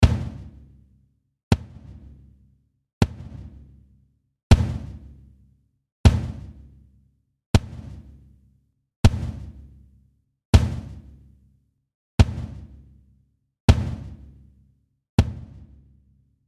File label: kick